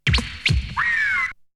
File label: Hit; ZERO G - Datafile DISC 1